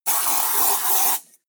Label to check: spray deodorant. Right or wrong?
Right